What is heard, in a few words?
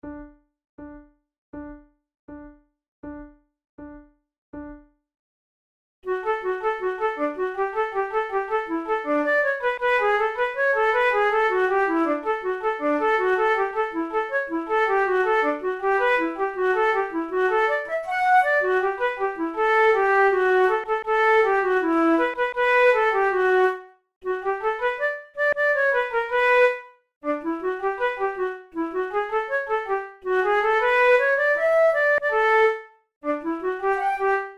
duet, flute